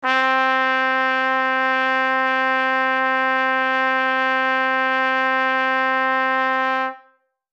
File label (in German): Spektrum Trompete C; obertone horen